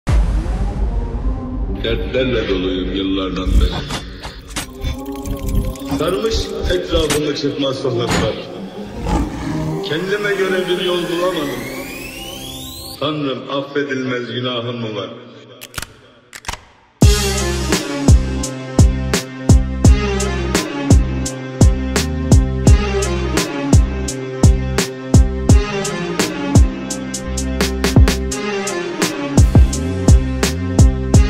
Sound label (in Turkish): arabesk beat